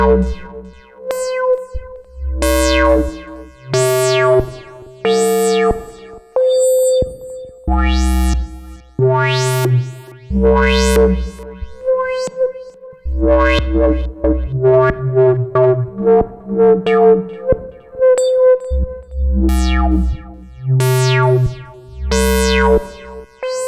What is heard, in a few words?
wavetable